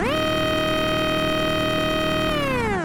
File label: scanner close